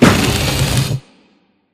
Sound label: Small Concrete Wall Start; Boomtown; Titanfall 2